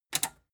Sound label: metal button tiny click enable fast